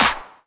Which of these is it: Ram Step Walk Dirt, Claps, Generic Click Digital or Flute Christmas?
Claps